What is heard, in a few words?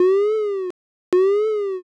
warning light